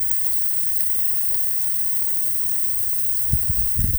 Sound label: light sizzling from the g, food